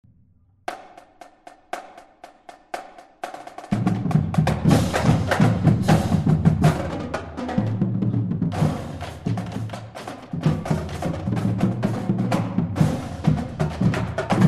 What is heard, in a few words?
Cadence, Drumline; Winter Con-2003-4